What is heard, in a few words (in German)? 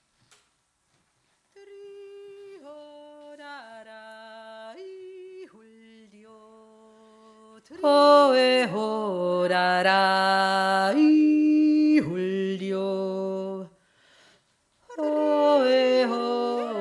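zweite stimme, jodler; aberseer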